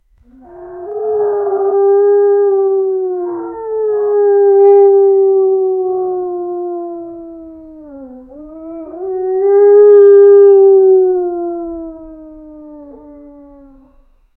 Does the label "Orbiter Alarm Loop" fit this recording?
No